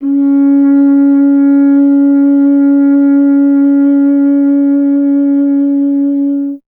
BRS; Miroslav Vitous; Solo Instruments VOL-2; Solo 2 Bank 1

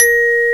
GLOCK, Fairlight CMI, MALLETS

